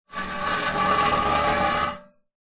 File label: foley metal large frame scrape drag screech concrete